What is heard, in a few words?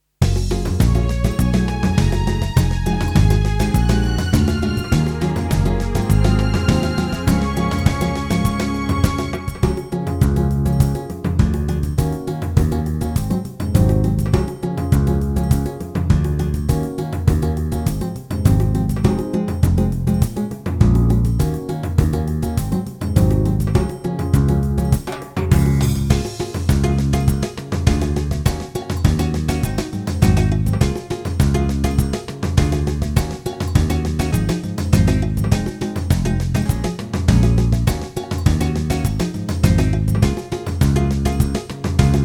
BRASILIAN SAMBA